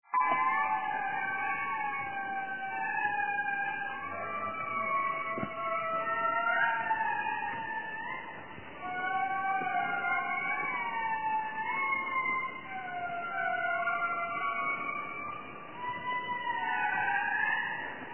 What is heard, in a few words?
indri; madagaskar